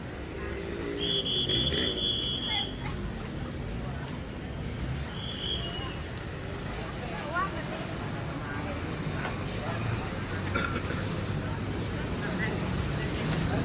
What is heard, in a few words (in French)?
ambiance